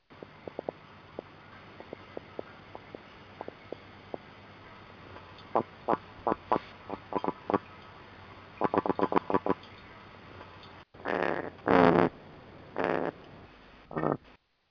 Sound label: Eutrigla gurnardus Growl Grunt Knock